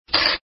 bet low

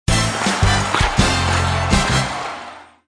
Normal Guard; hockey

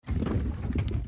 reel spin; gonzosquest; gquest

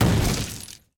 Single Explosion; mllegend

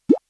Spin Button; goldvase

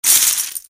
open Box; egyptslots

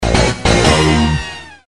result nobet

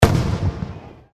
Scatter Third; Shengxiao

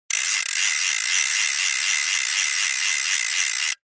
tiger box

